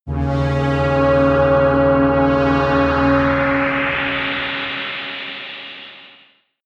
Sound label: air trumpet wind, drones